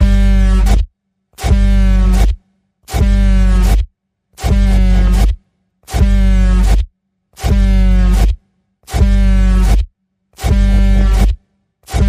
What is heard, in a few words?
Chopping Heads Panning Pulse G, Key of G